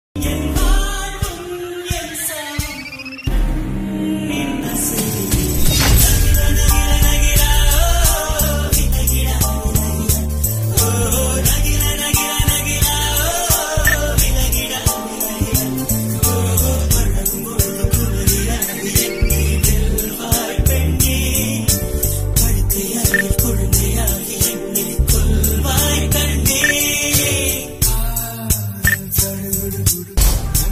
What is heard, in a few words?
love romantic tamil song; madhavan; alaipayuthe